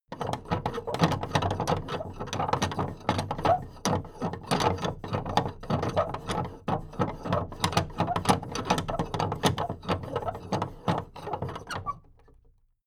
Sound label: big plastic case movement handle fast